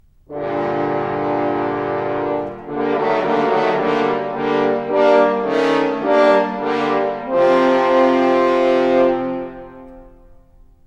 Wolf Horns

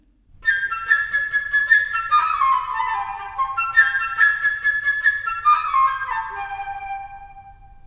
bird flute

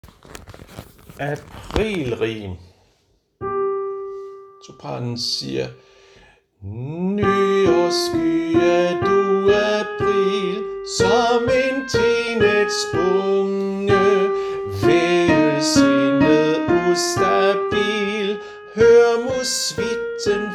sopran; harlev koret

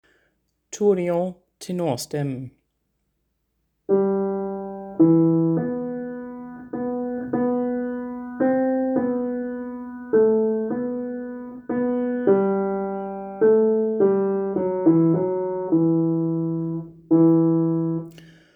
Tenor; harlev koret; Tourdion

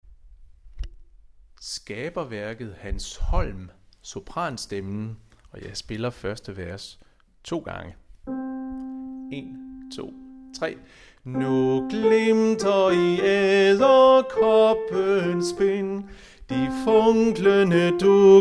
sopran; Skaberværket

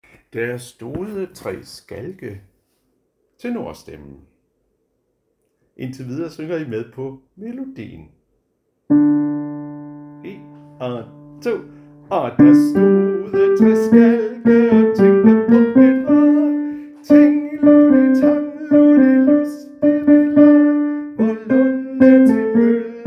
tenor; harlev koret

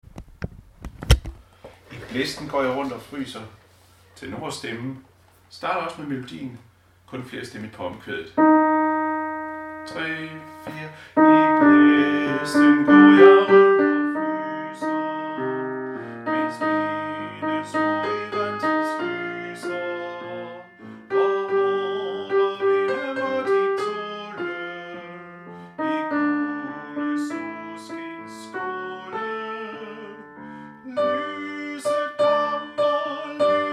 tenor; harlev koret

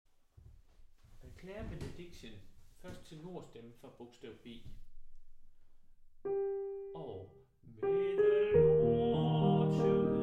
tenor; harlev koret

